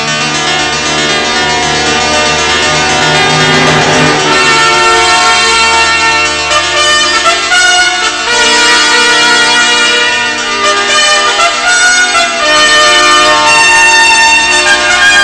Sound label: space music